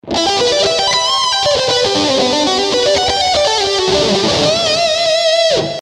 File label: rock fusion